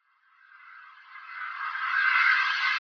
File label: white noise riser no tail; soundscrate